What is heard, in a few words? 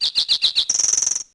bird; DAoC; Toa